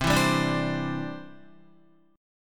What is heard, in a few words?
C Major Add 9th, Guitar, Cadd9 chord; strummed; JGuitar